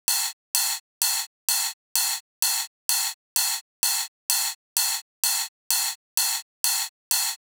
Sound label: Ride, Drum Loop 128BPM; VEC5 Drumloops; VEC5 Multi Loops 128BPM; Loop Elements